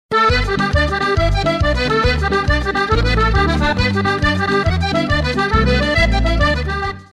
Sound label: jig; IV figura; SOUTH GALWAY REEL SET